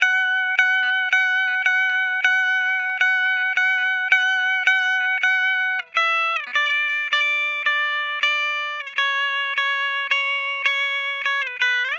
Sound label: D Dry Guitar Lead, Dream Pop Guitar; CelebrationCake; Guitar Hypnosis